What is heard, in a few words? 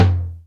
M1 TOM 1 LO; Greytsounds VOL-1